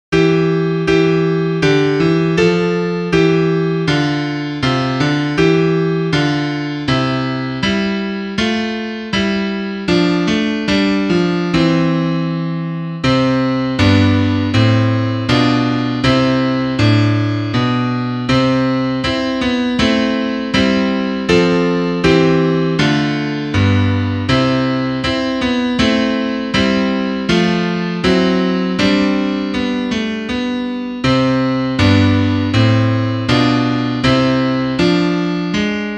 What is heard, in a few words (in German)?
Klavier; der mond ist aufgegangen